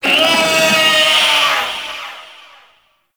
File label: Feral Vocal Spawn B; Warframe; Catbrow